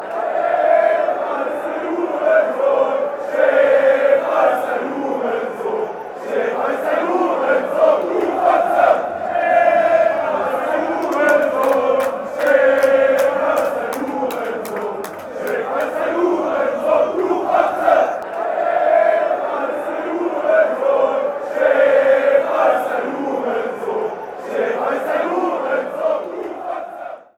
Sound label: woah woah woah fan chants; hertha